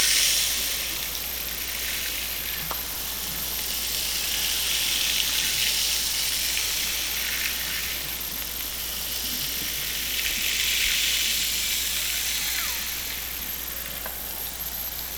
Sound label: Hydrophones, orcasound